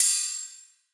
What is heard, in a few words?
Ride, Cymbals; Eight